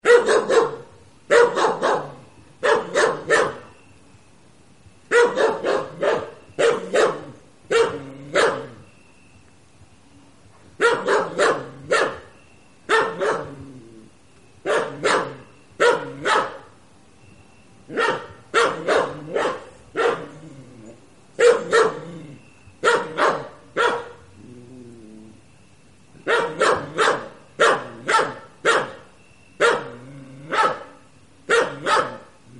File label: dog barking sounds